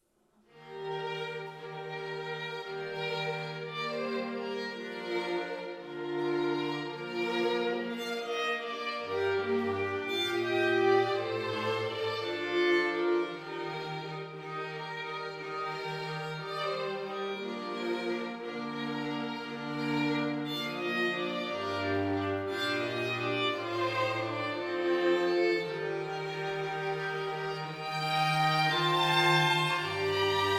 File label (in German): streichquartett